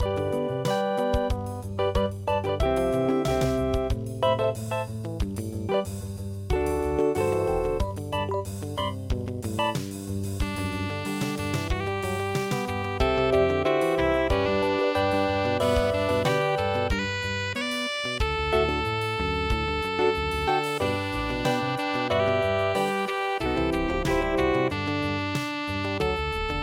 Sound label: hana fusion